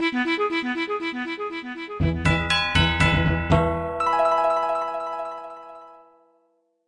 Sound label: Runecrafting Level Up; RuneScape 3